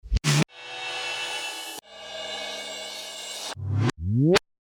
reverse; yamaha rx7

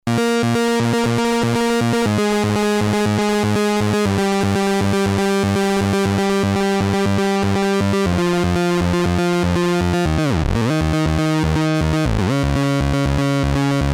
c64, Commodore 64 sid; MFB nanozwerg

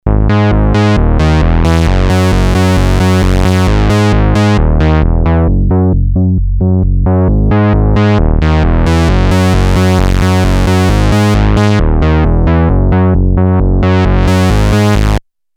bass, Moog minitaur

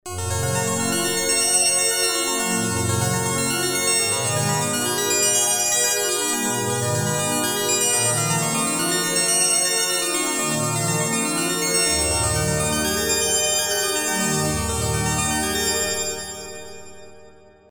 synth digital, Roland JV880

